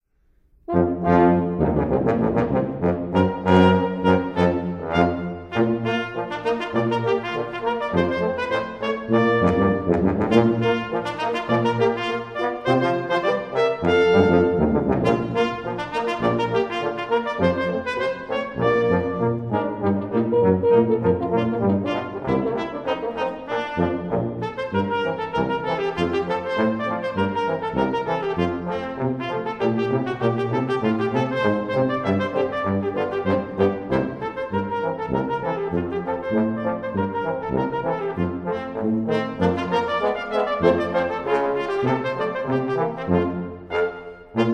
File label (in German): Blech Ragtime; Joplin